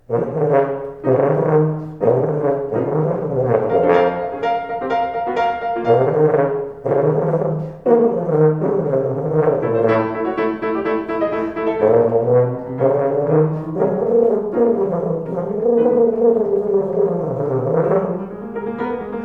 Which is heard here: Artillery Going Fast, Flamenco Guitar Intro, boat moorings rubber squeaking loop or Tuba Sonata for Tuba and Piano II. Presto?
Tuba Sonata for Tuba and Piano II. Presto